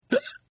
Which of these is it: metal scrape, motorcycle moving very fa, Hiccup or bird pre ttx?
Hiccup